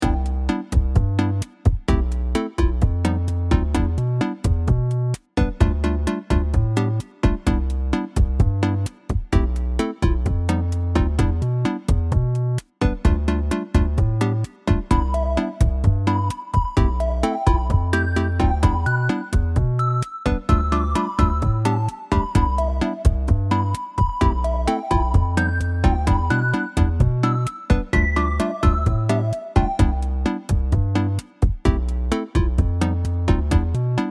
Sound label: 8bit bossa